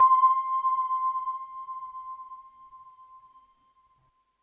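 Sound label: sine C5, Yamaha VP-1; Music Store; 90's Sample CDs; NICE SINUS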